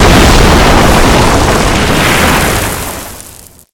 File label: dirt kick up